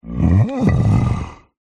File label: horror yeti monster growl grunt inquisitive; zapsplat